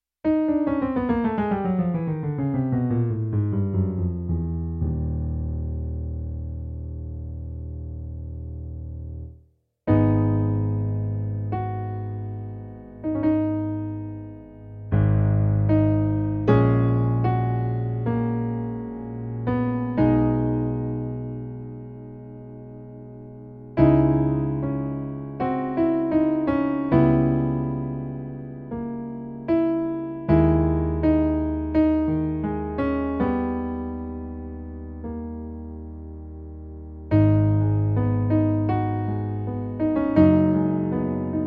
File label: lament